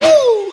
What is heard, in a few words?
"woo"